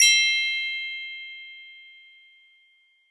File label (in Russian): zvuki volshebnoi fei